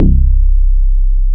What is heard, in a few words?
C1, BASSES